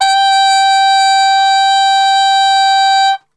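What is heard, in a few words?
G 3 trumpet cup mute; Best Service; Brass Super Section; TRP CUP MUTE; 90 s Sample CDs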